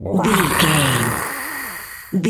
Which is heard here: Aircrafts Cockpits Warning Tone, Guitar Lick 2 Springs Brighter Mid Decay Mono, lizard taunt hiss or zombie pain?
lizard taunt hiss